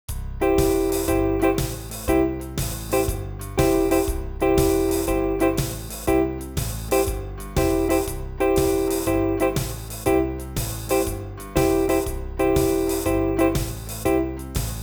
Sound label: concerto